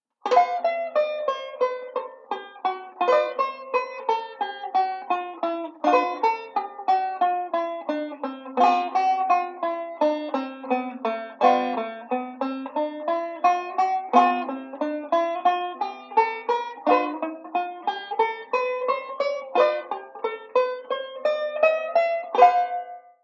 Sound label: G7 Chord Pattern, banjo; Beyond Chord Melody Volume II